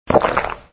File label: Gulp Sound; Extra Lives